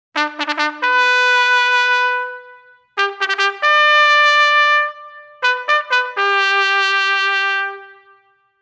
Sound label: Dress Parade, drum corps